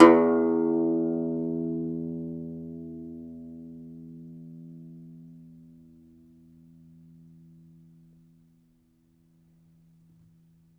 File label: DOMRA, Segundo Domra, Plucked Strings